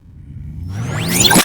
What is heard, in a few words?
turned off